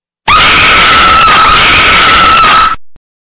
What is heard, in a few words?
Crazy Scream Ringtone